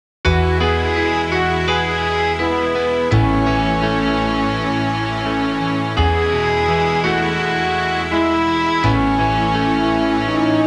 suonerie musica traditional usa country; american

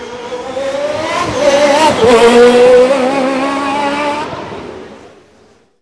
RACE CAR; trasporti; auto

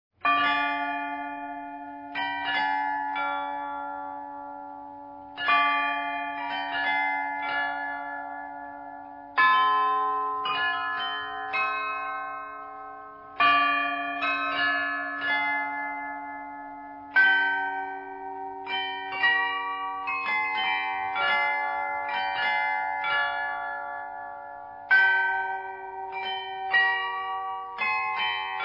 clock; orologi

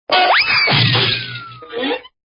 Bonk Crsh, cartoons

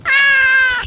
gatti cats; animali

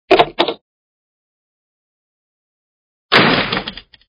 Door, cartoons

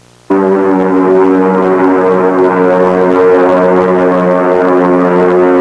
bomber, aerei; trasporti

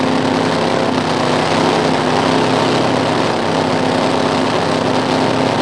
RACE CAR; trasporti; auto

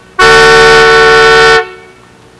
CAR HORN; trasporti; auto

